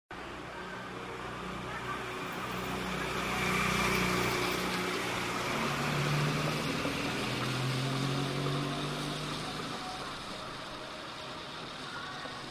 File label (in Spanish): calles; leiva y cabildo